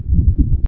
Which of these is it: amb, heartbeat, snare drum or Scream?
heartbeat